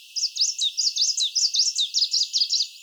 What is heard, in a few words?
viridanus Ala Archa